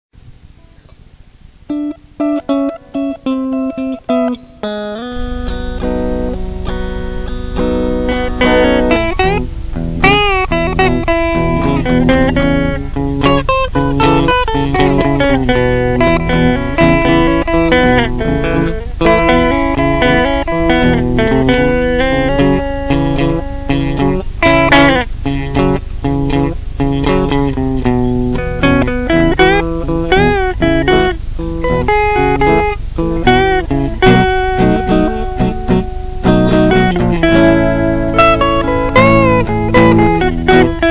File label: slow blues